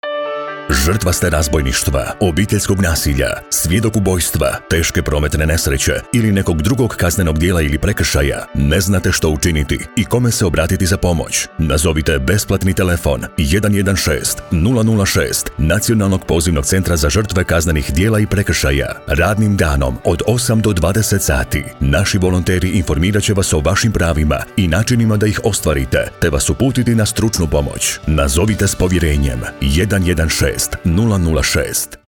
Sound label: Radio jingle